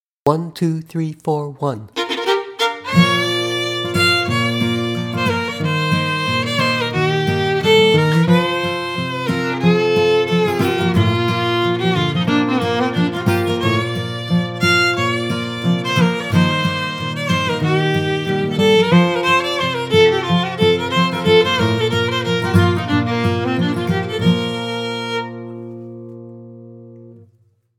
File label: Fiddle; Jack Tuttle’s Bluegrass Fiddle Collection; (1) easier version, my arrangement, Bb